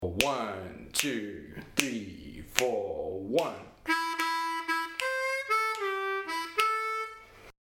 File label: Jam Lick, harmonica